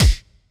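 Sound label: snare drum bass drum, Dance Mega Drums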